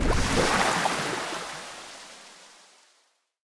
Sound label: water collision